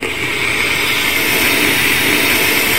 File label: ciga inhale